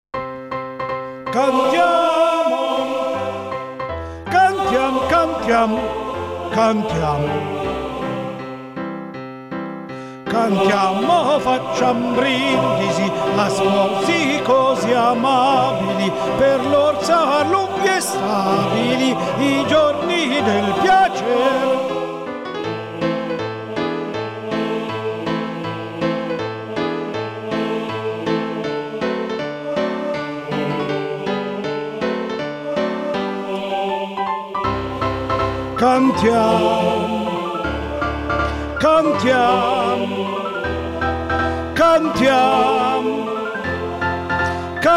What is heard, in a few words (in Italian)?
Soprani